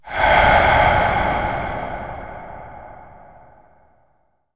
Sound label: DESTROY UNDEAD CAST